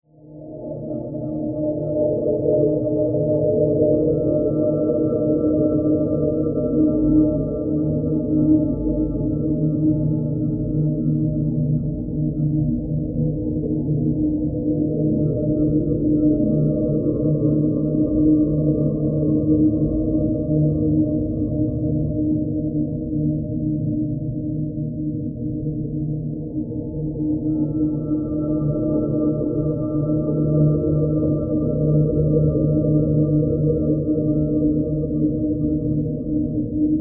Drone, Alien, Whale, Song, Ethereal, Scifi; 5.1

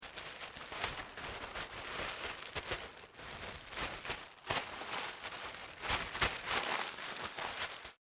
PLASTIC SHEET SCREWED UP; Material components for constructing more complex sounds; Plastic (Sound Effects:Materials)

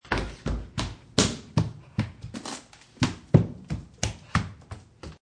Ftstps Kid Wood, Kid walks on wood floor.; Kid Walks Barefoot on Wood Floor; Footsteps; Human

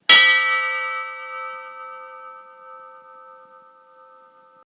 Bell Ship Antique Single; Bell Sound Effects; Bells (Sound Effects:Musical)

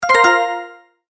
Multimedia Tone-Return/Back

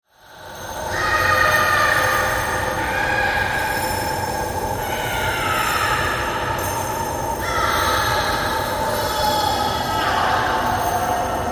Horror Dungeon Screams Chains Water Drips; Scary Soundscapes

